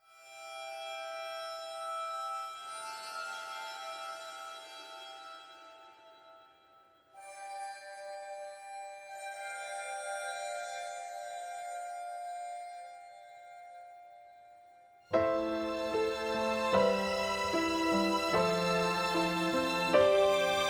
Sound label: reprise instrumental